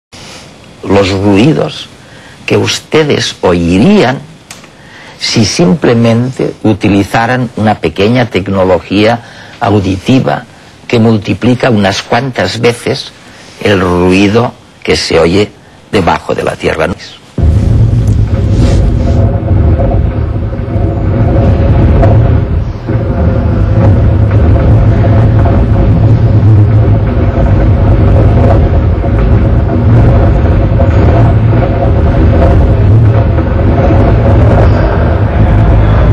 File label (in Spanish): ruido de la Tierra